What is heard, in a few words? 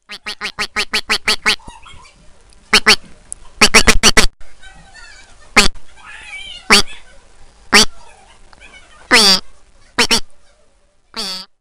funny bird msg tone